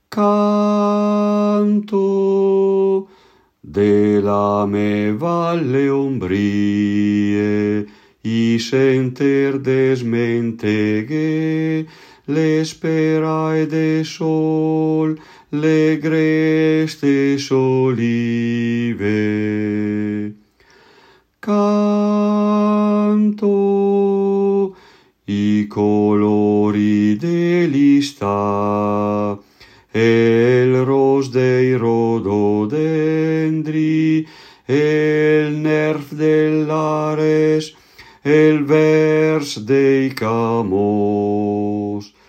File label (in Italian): Canto la me val basso